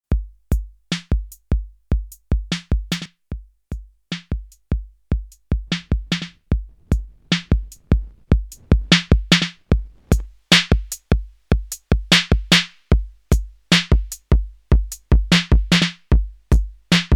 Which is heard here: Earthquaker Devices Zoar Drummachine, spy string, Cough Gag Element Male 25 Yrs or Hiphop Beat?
Earthquaker Devices Zoar Drummachine